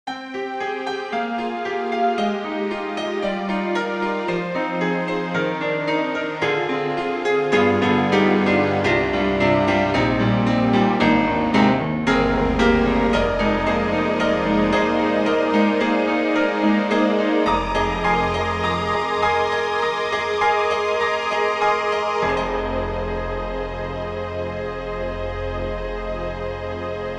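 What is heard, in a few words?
Analog Pad, piano; Steinberg HALion 6; HS3 Combis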